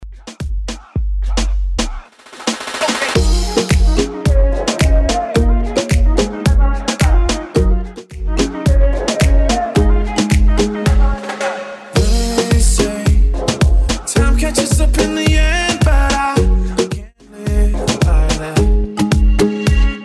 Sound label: Top40 109bpm